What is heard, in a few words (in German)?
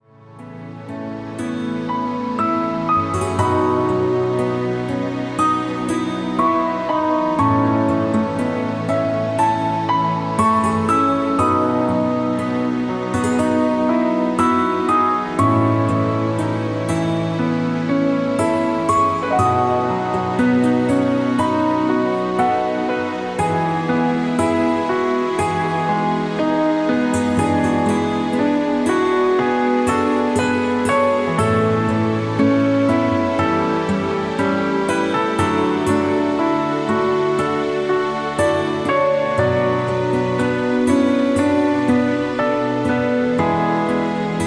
Entspannungsmusik mit Klavier